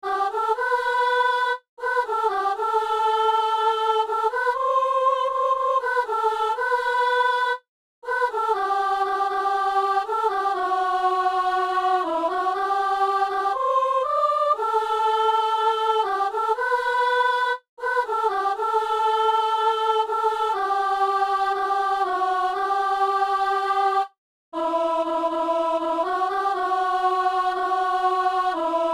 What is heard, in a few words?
soprano; Messe du 17 janvier à Saint-Denis